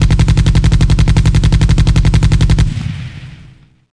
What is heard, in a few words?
LR300 full auto; Sierra On-Line; SWAT2DEMO